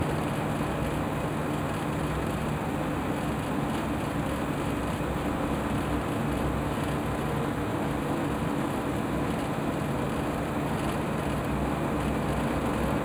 Machine Room Loop, Sci-Fi Sounds Hum and Ambience

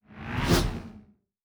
Fly By, Sci-Fi Sounds Movement

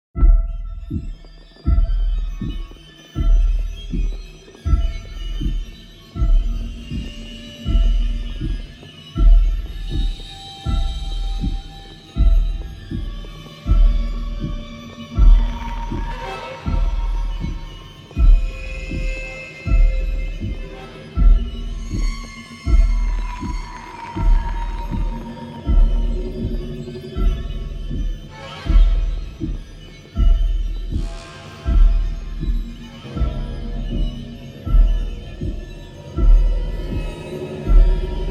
Suspense, 40bpm, Classic Horror Sci-Fi Music Pack; Dead Ark

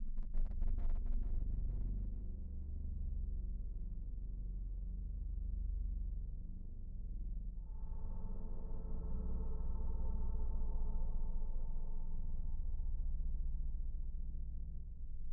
ambient loop

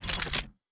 Weapon Reload (Laser); Sci-Fi Sounds

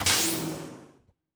Door, Sci-Fi Sounds

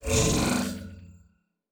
Teleport, Sci-Fi Sounds

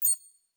Digital Click, Sci-Fi Sounds Interface

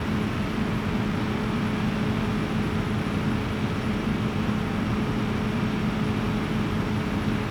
engine loop, sci-fi sounds, mechanical

